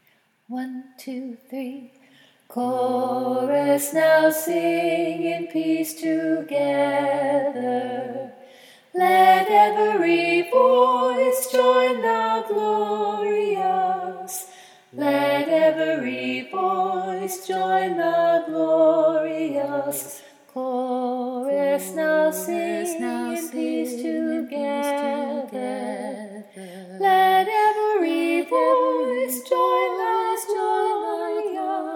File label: Glorious Chorus